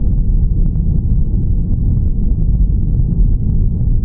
noise Loop

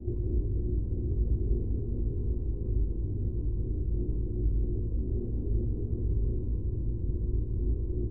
Engine idle

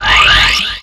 power up; single player map

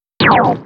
laser end

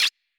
select; menu

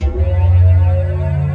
generator loop

